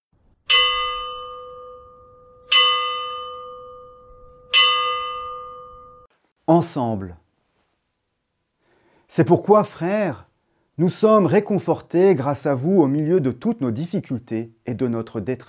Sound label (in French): ensemble avec musique